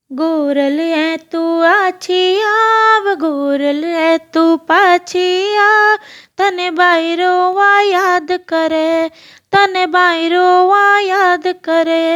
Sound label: Bidaa geet, gangor geet, marwari; बिदा का गीत; गनगोर गीत; ओ गोरल तू आंछी आव, ओ गोराल तू पाछी आव, तन बाई रोवां याद कर